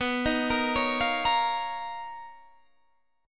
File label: B Major Sharp 11th